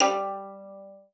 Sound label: G3, VIOLIN SPICC; Xsample VOL-5